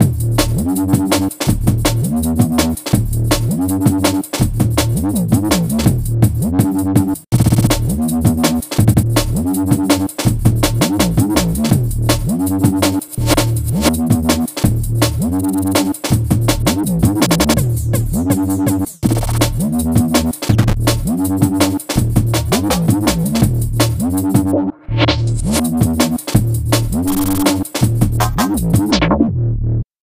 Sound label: Jungle Breakbeat